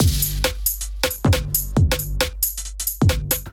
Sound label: Kit 136 bpm; MIDI Clips; Drums; Fear Press Kit; Ableton; Factory Packs; Skitter and Step